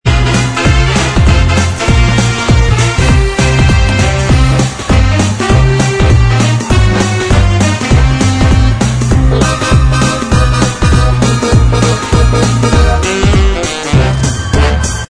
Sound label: jazz standard quickstep